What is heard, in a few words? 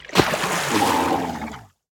Swamp boss Tail show